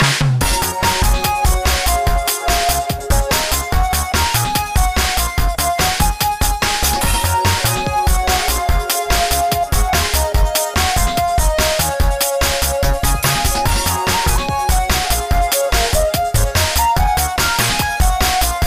Menu BGM

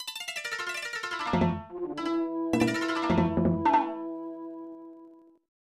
Unused Jingle; Shinzui Taikyoku Igo Go Sennin; SNES

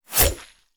Knife Stab

